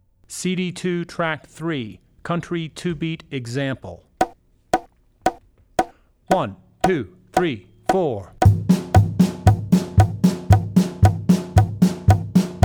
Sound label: Country - Two Beat; Drummer's Bible Tracks